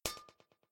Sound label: fail; skibiditoiletattack